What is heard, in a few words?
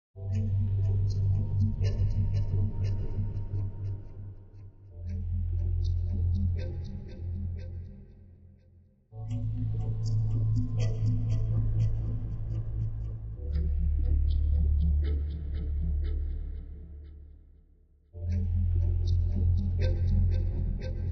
Cube Ambience